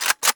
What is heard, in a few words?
AKM Cocking